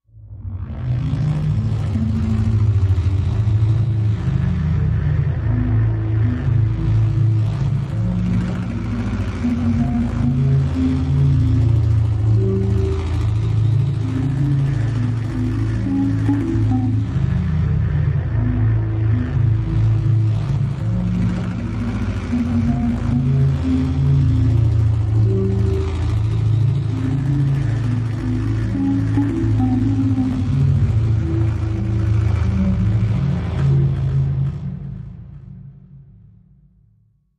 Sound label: Blue Noise Low Electro, sci-fi ambience